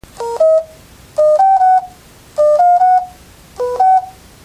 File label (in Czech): trojtón; mluvík